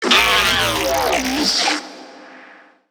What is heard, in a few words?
Necramech Ranged Death; Battle Barks